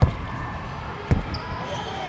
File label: Basketball, percussion; HOUSTON; ERJCC